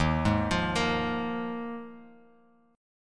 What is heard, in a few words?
Eb Suspended 2nd Suspended 4th Eb Bass Standard, Ebsus2sus4 chord; Ebsus2sus4 arpeggiated; JGuitar